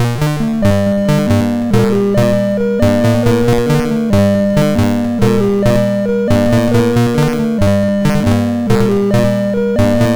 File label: Randomly generated music BASIC, sid MOS6581R2